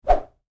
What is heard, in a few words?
Woosh, Whoosh: Short, Light; Sound Design; Whooshes; Stuart Duffield